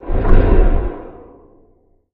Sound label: shadow explosion